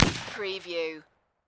Rifle Marlin 30-30 Single Shot Exterior At 45ft

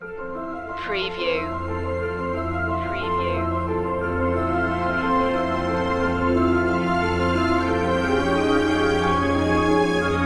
intro no choir

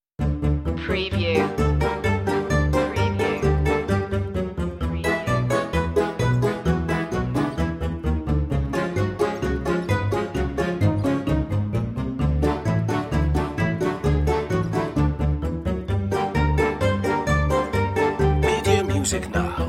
quirky comedy no perc

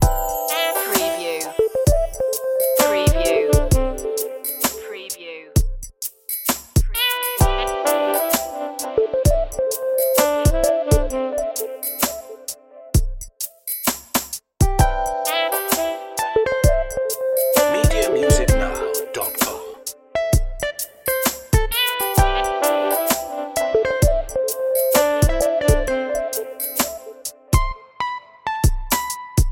sand and sun loop

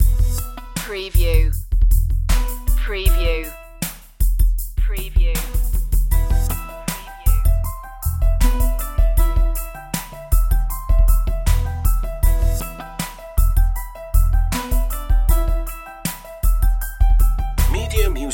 gangsta loop

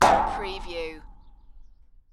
Metal Impact - Cartoon Funny Clonk Vibrato

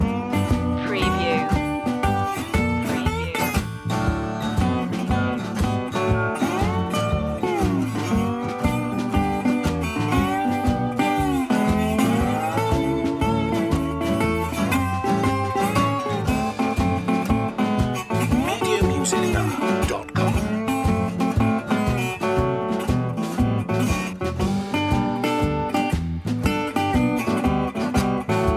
barbed wire blues loop

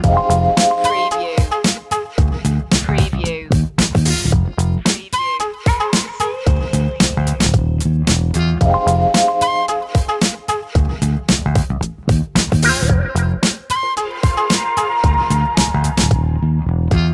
jazzomatic loop

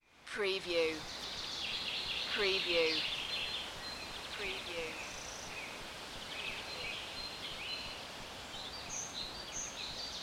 Birds In Light Forest Daytime

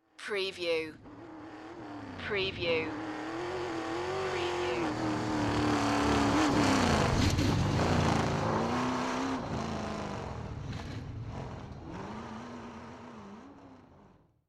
Chevy V8 Hot Rod Drive By

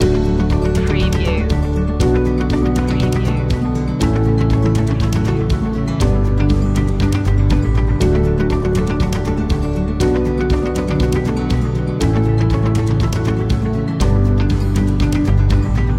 corporate acoustic loop

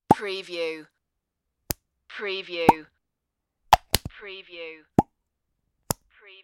pop plopp box

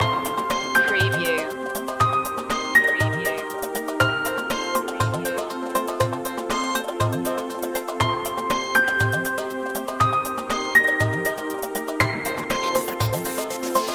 solar wind loop

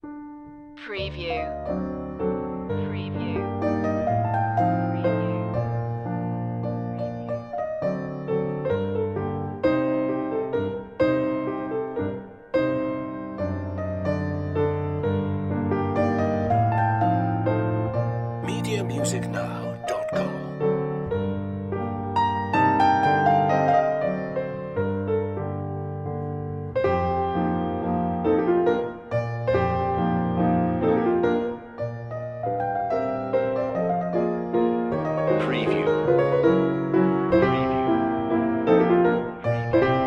mazurka g minor; opus 67 no2; classical music for media

